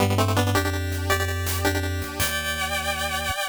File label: Mono Stereo, nes